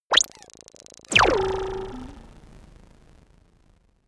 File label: laser shot crackle